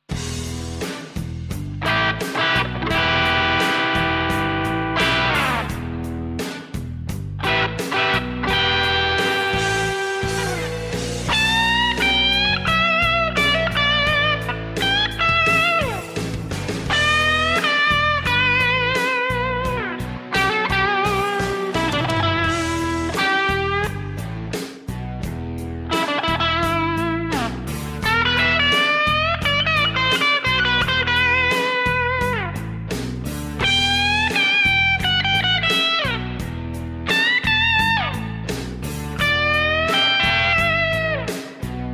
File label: blues guitar solo